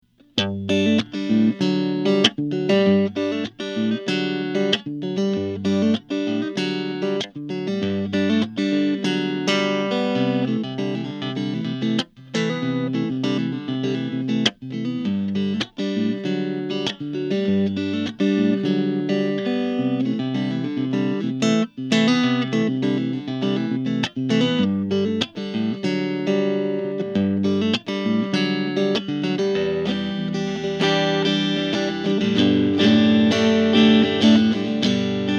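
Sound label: fender american strat tobacco sunburst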